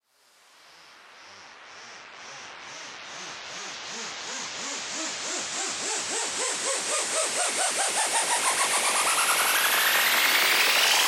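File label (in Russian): вжух скрипучий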